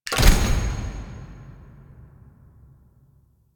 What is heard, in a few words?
UI Focus Rank Up Tree Unlock; DE; Warframe